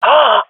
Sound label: death, swat fem; vo; agents; Counter Strike Model Pack; CS2